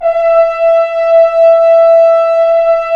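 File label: BRS F.HRNS, French Horns Ambient; Roland L-CDX-03 Disk 2; F.Horns 1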